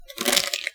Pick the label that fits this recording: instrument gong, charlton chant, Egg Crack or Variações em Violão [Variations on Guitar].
Egg Crack